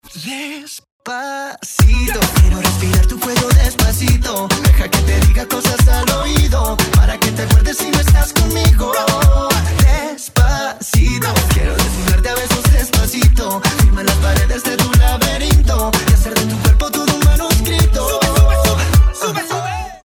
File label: BEAT